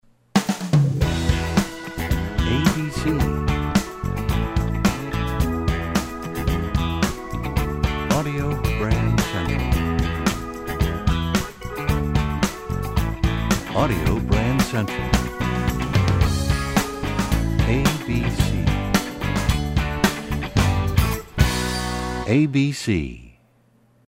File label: American Country, radio jingles